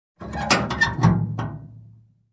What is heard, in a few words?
Open metal compartment